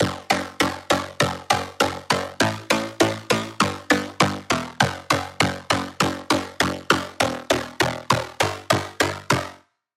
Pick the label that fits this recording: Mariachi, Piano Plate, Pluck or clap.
Pluck